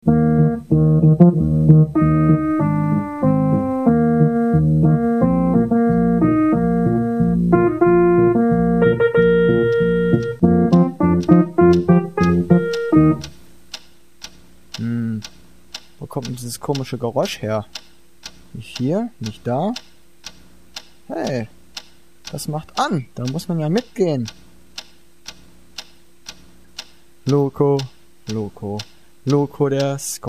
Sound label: mysterious ticking noise